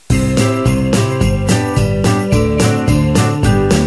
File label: hook give; jb engine; boss